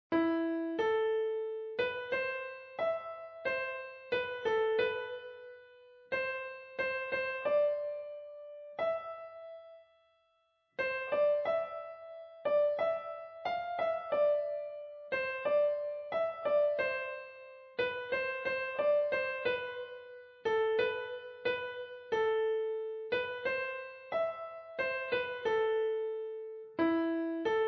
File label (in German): Maria durch ein Dornwald ging Klavier; für Klavier solo, Schwierigkeitsgrad 1, sehr leicht